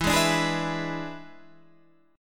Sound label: E 7th E down Guitar Drop D